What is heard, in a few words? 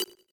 click; ufoai